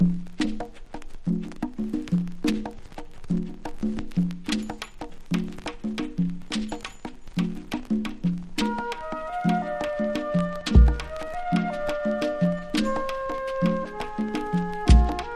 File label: super latin percussion; LATIN JAZZ